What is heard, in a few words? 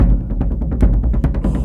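Music Shield; From Dust; PS3; Xbox 360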